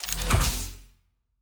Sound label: Door, Sci-Fi Sounds